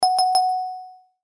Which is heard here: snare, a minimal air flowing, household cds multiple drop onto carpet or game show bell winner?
game show bell winner